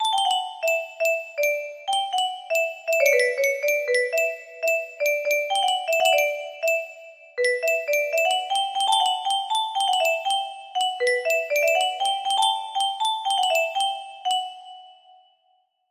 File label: melody